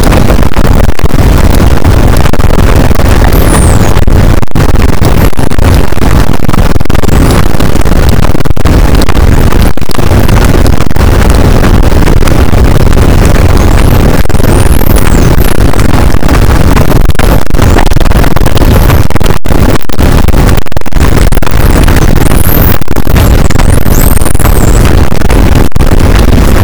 cachalot positif, boat; Physeter Macrocephalus

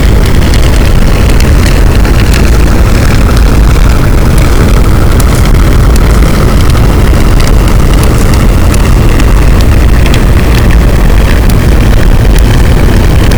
cachalot positif, boat, Physeter Macrocephalus

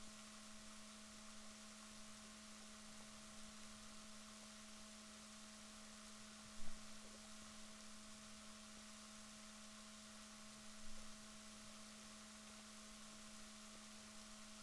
rorquals negatif, Balaenoptera Physalus

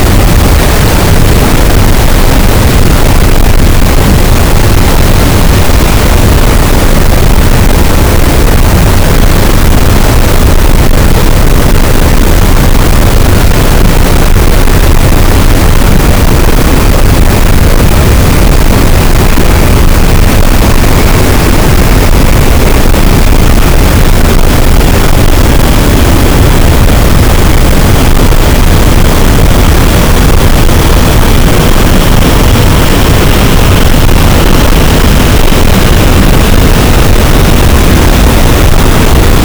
cachalot, gaussian noise; Physeter Macrocephalus

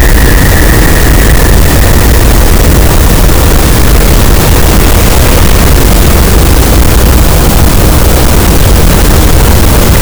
cachalot, gaussian noise; Physeter Macrocephalus

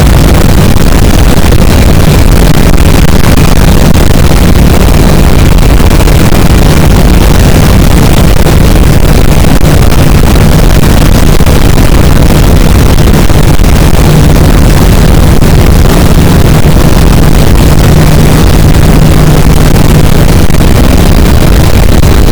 cachalot negatif, boat, Physeter Macrocephalus

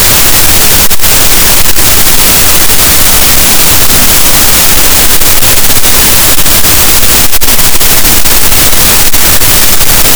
cachalot, Physeter Macrocephalus, gaussian noise

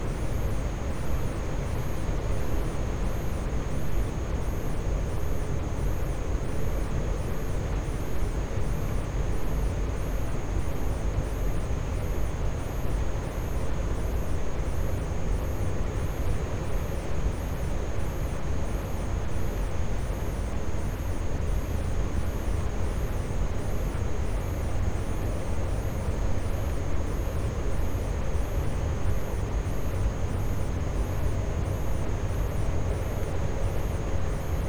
cachalot negatif, Physeter Macrocephalus

